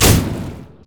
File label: tricleave; riven